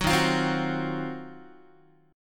Eb Minor 6th Add 9th Eb down Guitar 4A,4E,5A,5D,5G sharp,6C sharp; strummed